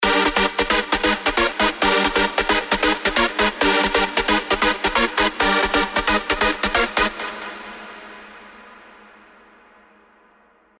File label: Dark Machine, rave stabs; Spire; revealed